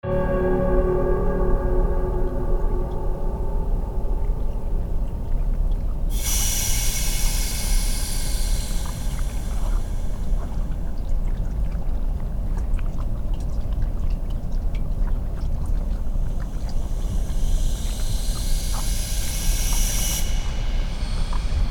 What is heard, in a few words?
Ambient; the-third-place